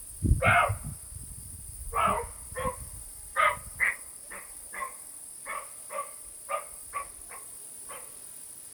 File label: Abbaio capriolo capreolus roe deer reh chevreuil corzo